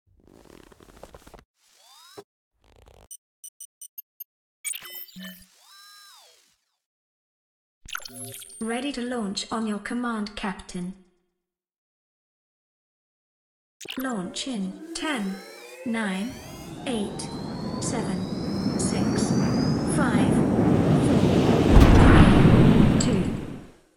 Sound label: End Cinematic SFX